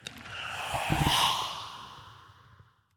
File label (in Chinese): 不祥试炼刷怪笼环境声; ambient